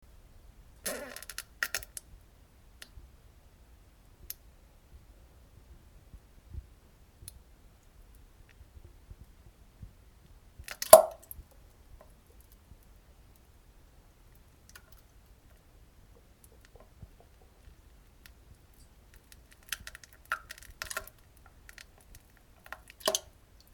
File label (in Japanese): ちいさいおならとなんべん